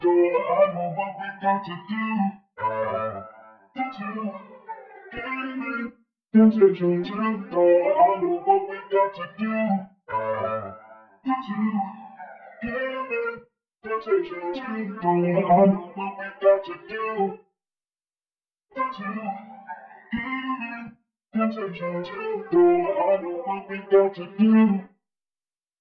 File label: 128 BPM D Min Vocal; Melodies; Loop Stems; Cymatics; 2022 Melody Mystery Expansion; Temptation